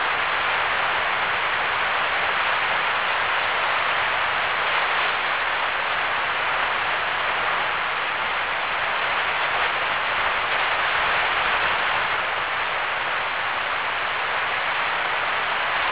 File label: jupiter s burst io b storm stereo